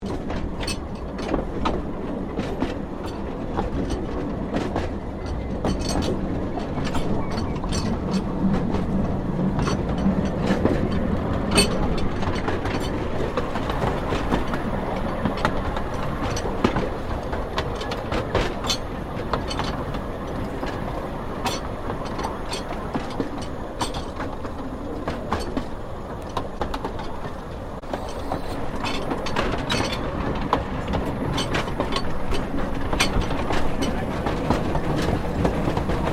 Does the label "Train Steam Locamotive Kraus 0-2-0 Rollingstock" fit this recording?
Yes